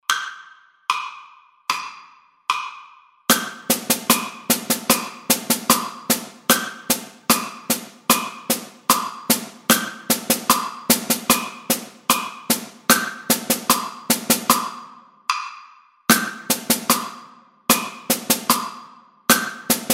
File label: drum set